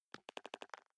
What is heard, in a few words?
group call connect; tweb-i2p